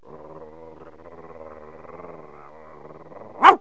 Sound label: angry dog; animals; Wired for Sound Pro CD; Aristosoft, Inc.